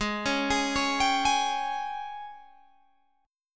Ab Major 7th Suspended 4th Ab down Guitar Open G

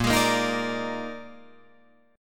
Bb 11th, Guitar, Drop D

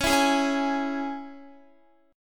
Db Minor Db down Guitar; strummed; 4E,4A,5D,5G,5C,6F

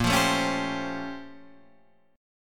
A sharp Minor Major 13th, down, Guitar, Open D

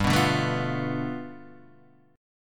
G 9th; strummed

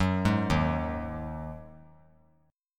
Eb Minor Double Flat 5th; arpeggiated; chord; x,13,11,13; JGuitar

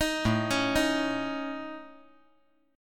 A Major Flat 5th

